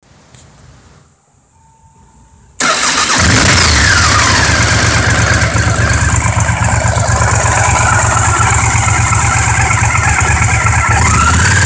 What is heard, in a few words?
scary noises